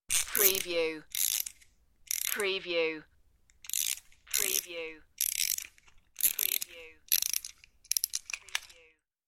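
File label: Wind Up Toy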